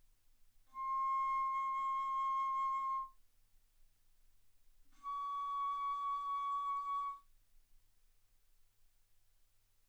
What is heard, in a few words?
Bass Flute ff C6 Db6